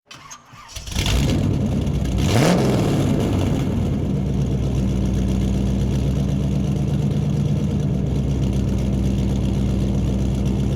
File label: muscle car big room